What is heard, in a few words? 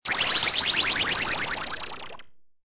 magic drain